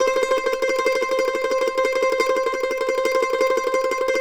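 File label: mandolin tremolo C5; Sampleheads; Whole Lotta Country 1 & 2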